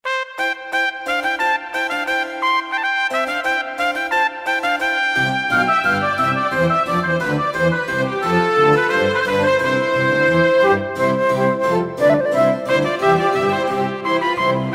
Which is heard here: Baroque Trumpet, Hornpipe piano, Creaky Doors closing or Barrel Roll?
Baroque Trumpet